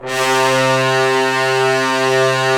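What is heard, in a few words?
brass trombones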